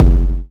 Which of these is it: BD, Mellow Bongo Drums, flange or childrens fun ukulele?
BD